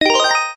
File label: WIN; Lighting Hot